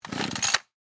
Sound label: Hedge Trimmer Pull, Household, Hedge, Trimmer, Pull, False, Start; Weed Whackers